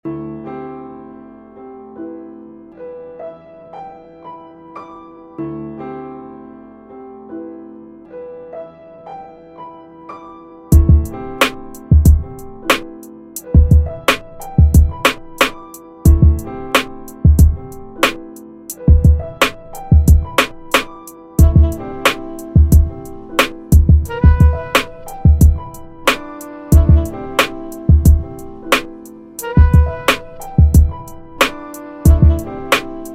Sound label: piano